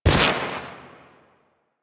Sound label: Step Snow, Foley, Footsteps; Enemies; Warframe; Duviri; Dax